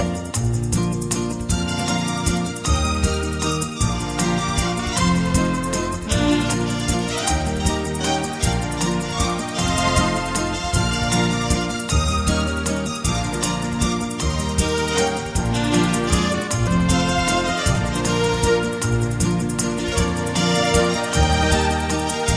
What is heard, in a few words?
Mariachi Waltz